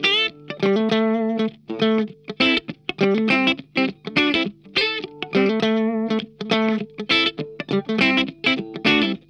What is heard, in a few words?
PICKY, Funk Guitar; Zero G - Funk Guitar